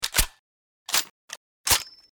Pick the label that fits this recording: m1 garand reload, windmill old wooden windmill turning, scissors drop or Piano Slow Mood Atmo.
m1 garand reload